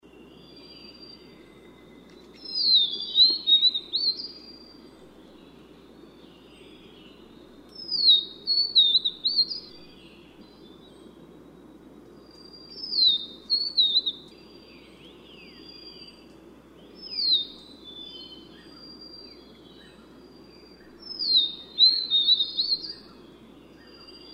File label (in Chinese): 黃腹琉璃; 黃腹仙鶲、棕腹藍仙鶲; 鶲科/留鳥